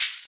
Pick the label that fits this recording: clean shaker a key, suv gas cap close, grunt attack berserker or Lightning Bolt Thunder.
clean shaker a key